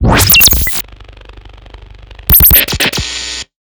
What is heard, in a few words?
Areola Scratcher; Plastic Surgery